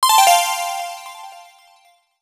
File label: Diamond Alert; Sony Ericsson; C901; PlusFourSix; Lars Edvall